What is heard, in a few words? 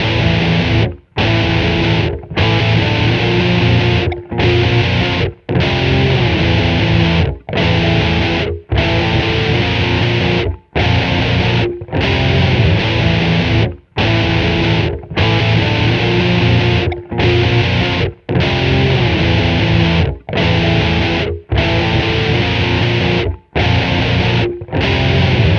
Guitar, Rock